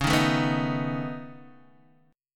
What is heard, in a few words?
C sharp 7th Suspended 2nd Sharp 5th C sharp down Guitar DADGAD